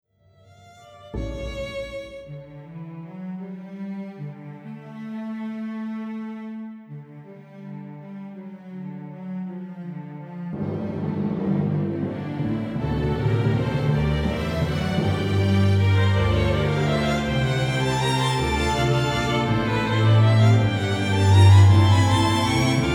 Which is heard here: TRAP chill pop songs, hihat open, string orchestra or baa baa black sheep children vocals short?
string orchestra